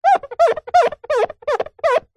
plastic squeak rub finger fast; foley; zapsplat

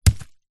stone med drop on dirt; zapsplat; foley

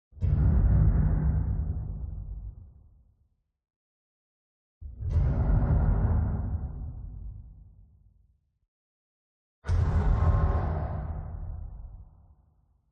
CINEMATIC HIT Deep Metal Impact; smartsound

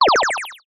multimedia game sound classic 1 up

